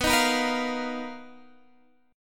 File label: B Major 7th Flat 5th B down Guitar DADGAD